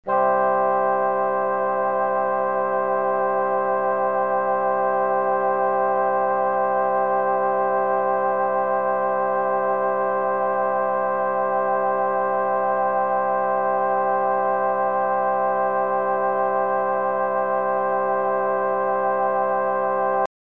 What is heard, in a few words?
c major chord